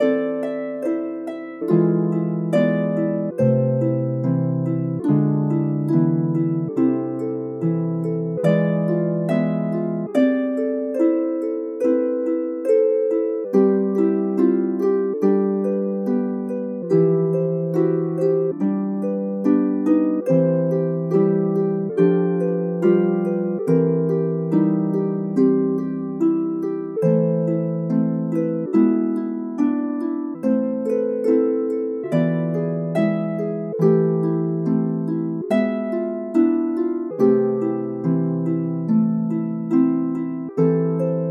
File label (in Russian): Arfa Muzyka Zvuki Spat